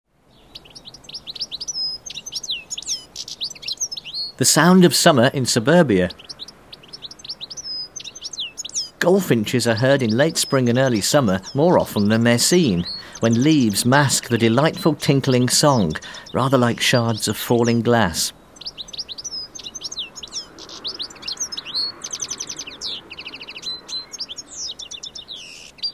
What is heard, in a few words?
Goldfinch - Chardonneret; bbc Bird Song Site